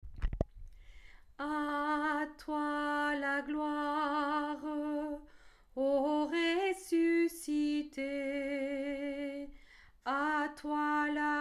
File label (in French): ALTO, chant a plusieurs voix; atelier de chant a plusieurs voix